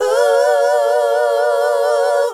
Female Group, Hip-Hop and RnB Vocals

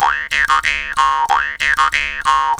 D 93, JAW D 93BPM, Primitive Instruments; Didgeridoo and Other Primitive Instruments

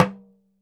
Talking Drum; E-MU Producer Series Vol. 7 – Old World Instruments; Drums&Percussion

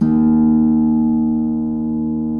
Harp Eb1; Digital Sound Factory Virtuoso

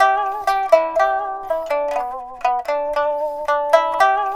PIPA, 90; INIS - Opium

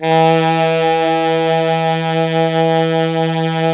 SAX 2 Altos E 3; Alto Sec

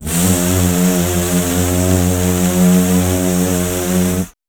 WEIRDOS, Voice Spectral

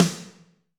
Snr Amb Punch, Alesis D4

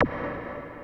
Moog PC; Moog Modular55

